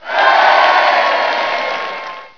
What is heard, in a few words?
Yay